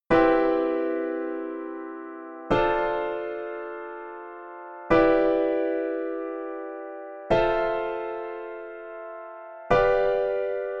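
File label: Guitar 7th chord piano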